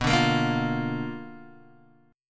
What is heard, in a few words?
B Minor Major 7th Flat 5th Guitar DADGAD